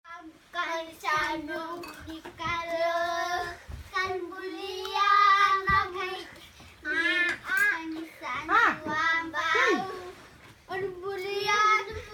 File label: kids singing